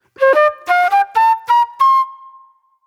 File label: J-Funk Flute, Call And Response; Sonic Foundry (Sony Creative Software); Manhattan Flutes